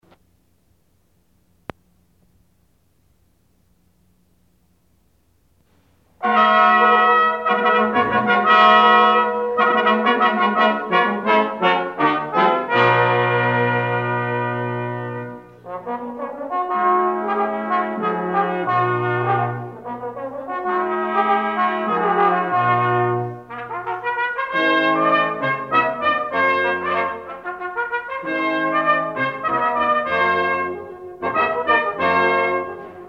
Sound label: fanfare